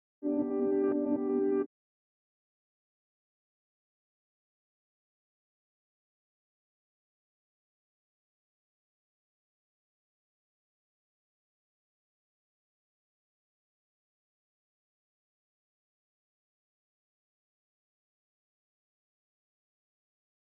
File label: 86 BPM A Min Reverse Piano; Melody; Infinity Melody Collection (Preview); Cymatics